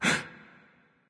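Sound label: nonverbal breath; smite; voicepack; ullr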